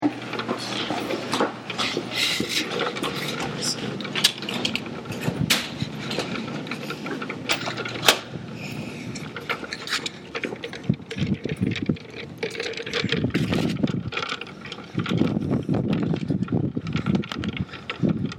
sound, walking

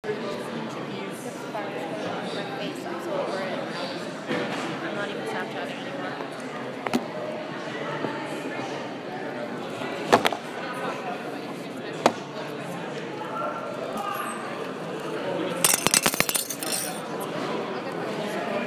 Field recording, dinner; February 12, 2014, 8:42pm, HofUSA

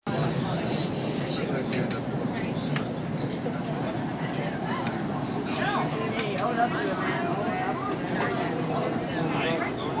Lirr Train To Penn; The train from Mineola to Penn Station. The Tickets are being punched while the passengers

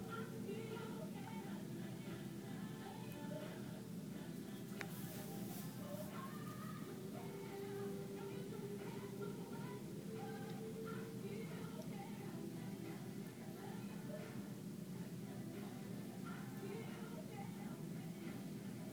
14th floor hallmates music

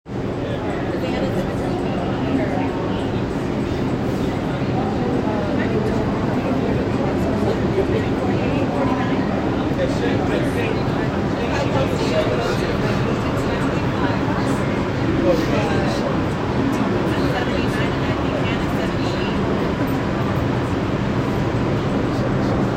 Train Ambiance

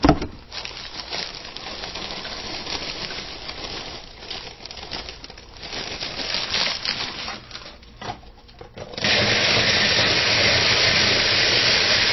Cooking, faucet

